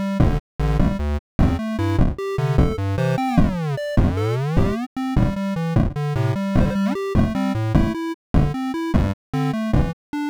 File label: beepbox song